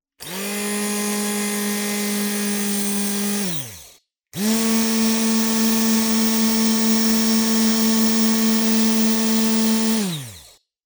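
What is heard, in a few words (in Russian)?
звук блендера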